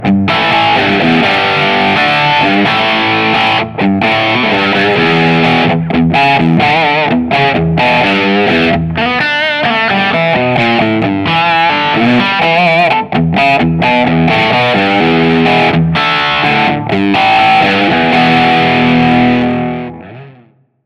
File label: Clean strat B pickup; Treble 14; Middle 11